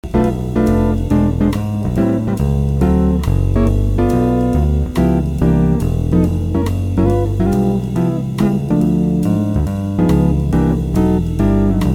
Block Chord Solo, stick; Block chord solo over walking bass; Chapman Stick

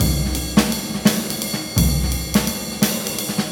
Loop Mono, jazz pedalboard

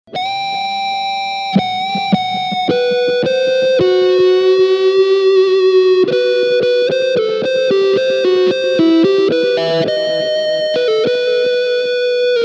GP16, guitar sounds; distortion programs; The distortion programs in the GP-16 are very bright and buzzy

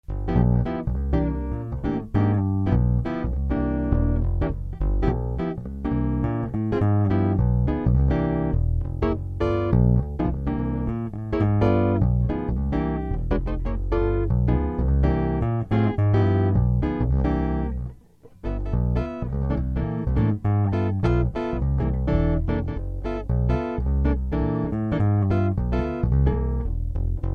Drop 2s, stick; Drop 2s Over a I-IV progression

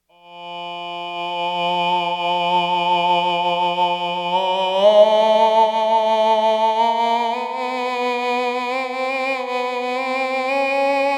Robo Tenor, continuum

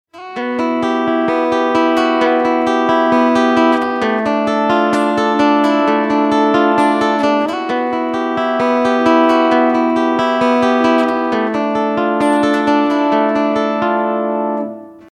VG8, guitar sounds

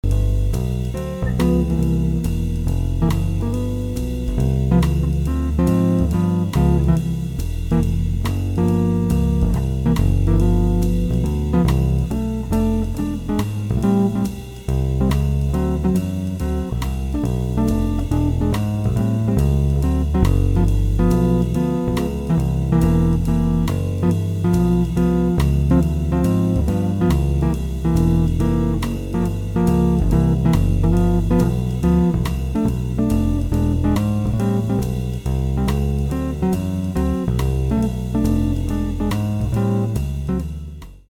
Shell Accomp, stick, Blues Noodling; Accompaniment to play behind a soloist, 2-note shell voicings over walking bass; Chapman Stick

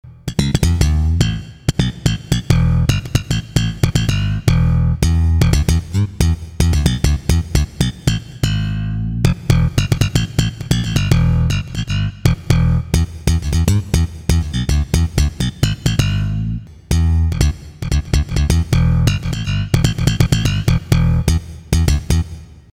Sound Canvas Slap, Continuum

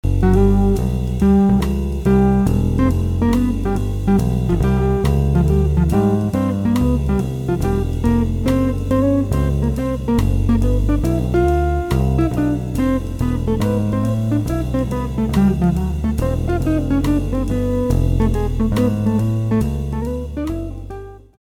Octave Solo, stick; Octave solo over walking bass; Chapman Stick

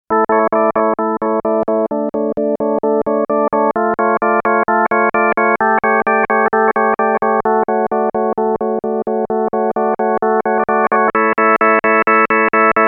Nord; class rock

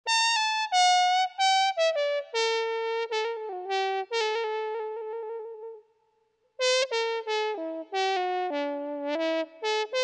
Reaktor Harmon Trumpet; wind sounds

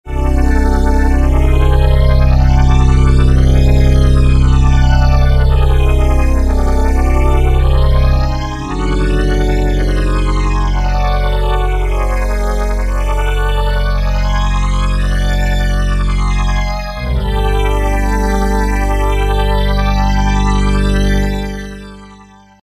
Nord ARP Strings; Nord Modular

